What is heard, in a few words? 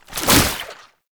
Infested Spore Pistol Reload; Weapons